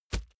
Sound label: plastic tense bag soft heavy